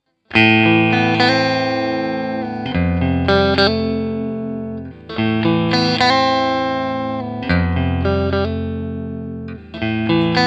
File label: red hot clean d caster